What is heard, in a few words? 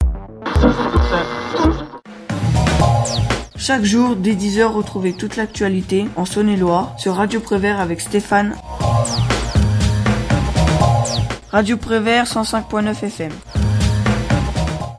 jingle; radio prevert actu